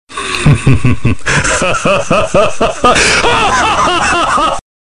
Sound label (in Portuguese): risada alta